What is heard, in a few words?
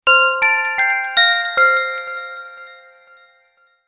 notification ringtone